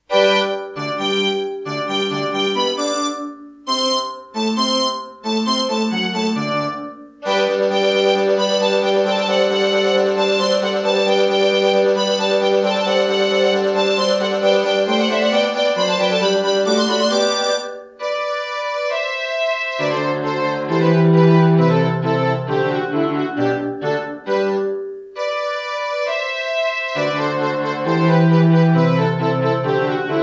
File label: Serdaco Crystal Blaster C1; Mozart - Eine Kleine Nachtmusik partial; classical